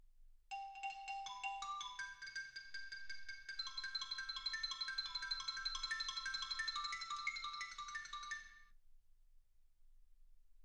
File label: xylophone